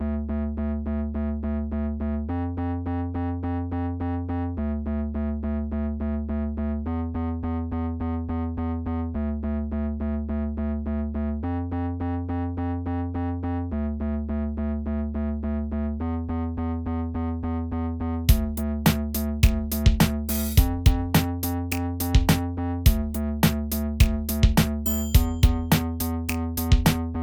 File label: Deep Pt. 2 Electronic Hip Hop 105BPM Em